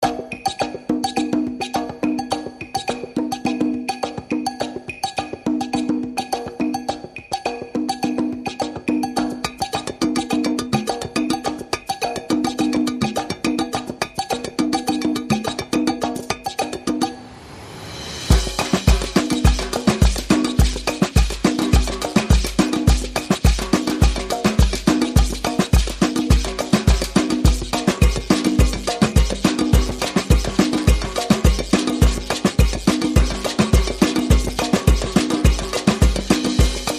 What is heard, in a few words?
Mercado Fun (Score), Percussion and Effects